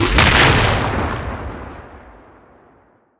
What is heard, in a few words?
shockwave shoot